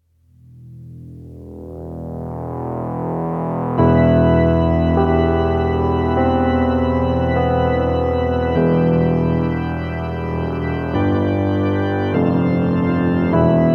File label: hannah 3 violins